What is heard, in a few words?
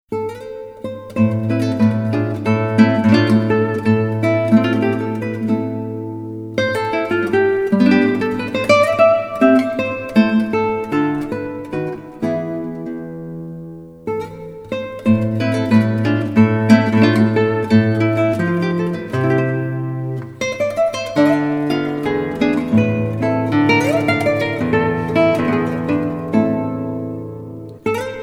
Solo Guitar